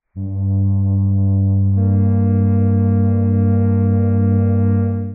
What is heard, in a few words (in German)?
flöte g b1; Intervalle, die man durch Potenzen von 2 und 3 darstellen kann